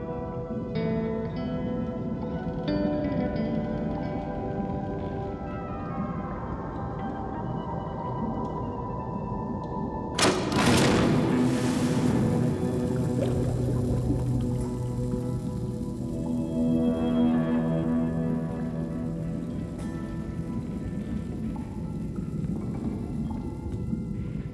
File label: Theme A ~ C (fade to drums and synth); mcsm music; Netflix